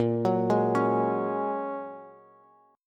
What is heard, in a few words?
A sharp Minor 7th Sharp 5th, Bass; arpeggiated; 18,21,18,18; JGuitar